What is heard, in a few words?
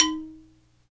XYLO, Afro Xylophn; Roland LCDP11 Africa VOL-1; Bala & Xylo